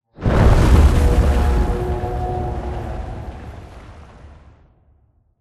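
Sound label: Explosion Rumble Exterior Distant Low Texture; Boomtown; Titanfall 2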